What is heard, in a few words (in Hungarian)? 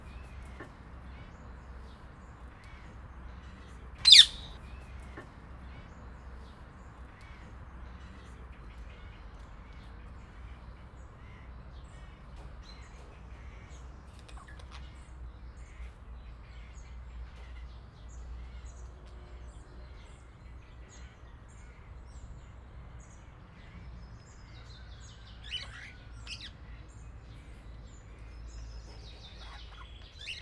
nagyon visszafogott, kis sándorpapagáj; kecskemeti zoo 2016